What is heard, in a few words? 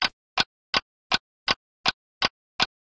Ticktack; Huawei C8813D